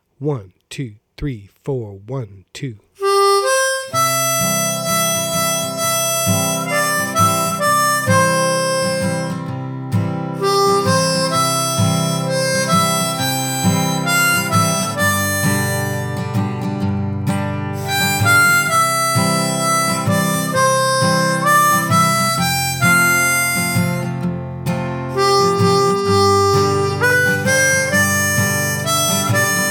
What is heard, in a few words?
Red River Valley Fast